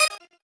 normal hit normal

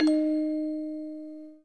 orgel

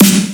normal hit clap

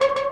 synth; osu

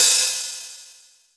open high hat

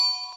Bells

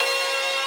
synth main

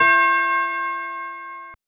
bell E half #5; osu

